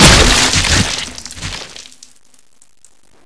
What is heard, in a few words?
pwrench hit bod, chainsaw; svencoop